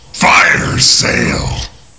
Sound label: fire sale; nzp